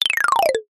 cartoon climb down descend fast steps ladder cute

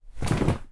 household bathroom mat wet drop on floor

